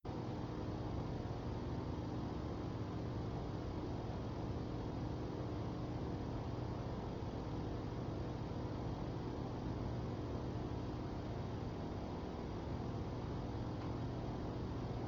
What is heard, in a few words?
Gaming Noise